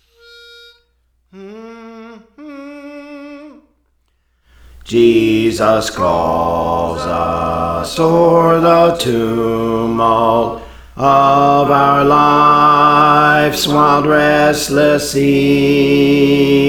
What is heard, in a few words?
Bass; Praise For The Lord Training; Jesus Calls Us